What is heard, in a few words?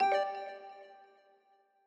Notify System Generic; Longhorn Valley Sound Scheme by David Mounder